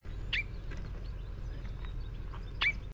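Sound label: Bullock's Oriole call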